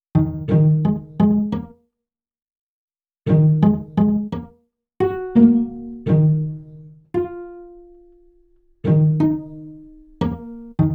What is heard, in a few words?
Strings, Cinematic Funk; Sonic Foundry (Sony Creative Software); Cinematic Funk Urban Tone Poems